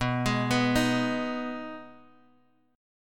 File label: B 6th B down Guitar DADGAD